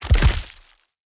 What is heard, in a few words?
UI Building Placed; A Year of Rain; Undead